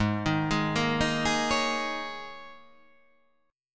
G sharp Suspended 2nd Suspended 4th G sharp, guitar; x,11,13,13,10,10,10,10